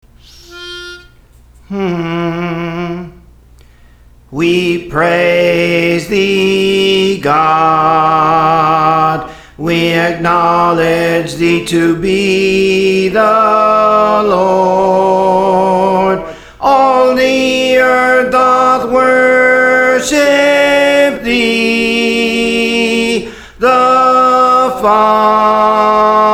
Soprano; Praise For The Lord Training

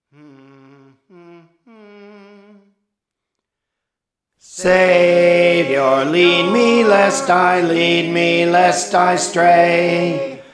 Alto; Praise For The Lord Training

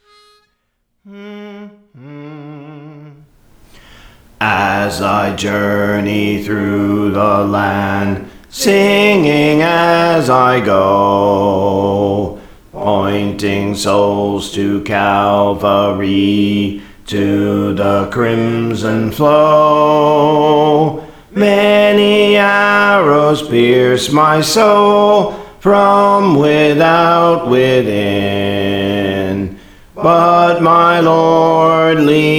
Bass, Songs; Praise For The Lord Training

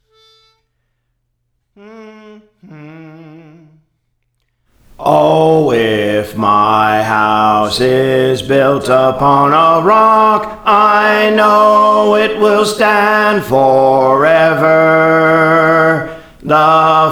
Bass; Praise For The Lord Training

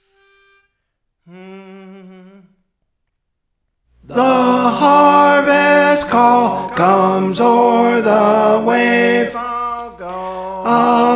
Tenor; Praise For The Lord Training

